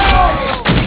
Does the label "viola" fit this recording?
No